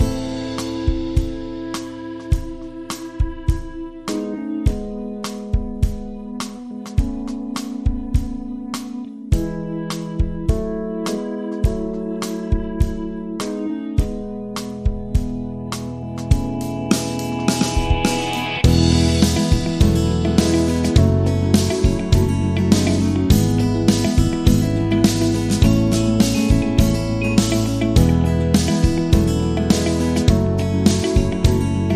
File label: Groovy Pop Explosion; 60sec